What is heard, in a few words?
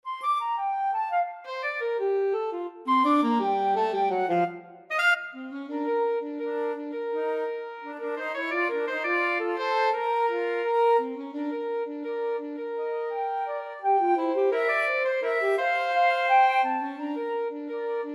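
flute alto sax duet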